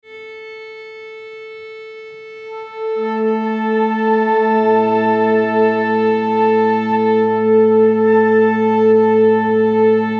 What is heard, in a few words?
Orchestra, Orchestra Flute Section Tune Concert A; Orchestral; Blastwave